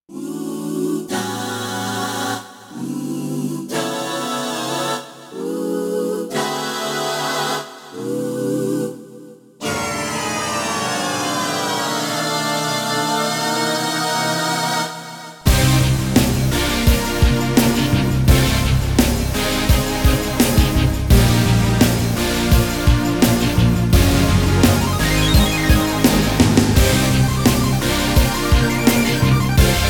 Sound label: Fast Finger